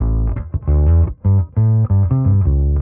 bass, Loops Phonky

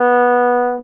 hummer, Horns; Notes

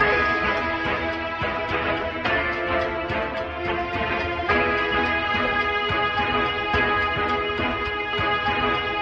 strings, Loops; Phonky

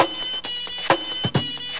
bodyrock, Drum loops

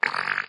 fart wet short; Farts

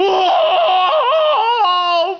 scream long; Voice

